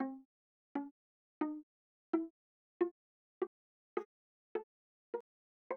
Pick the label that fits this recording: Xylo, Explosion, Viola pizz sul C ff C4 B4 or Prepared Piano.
Viola pizz sul C ff C4 B4